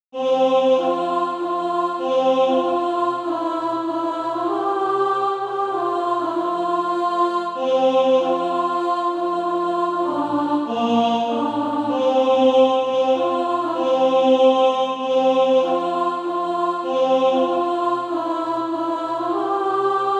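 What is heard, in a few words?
alto, Practise Music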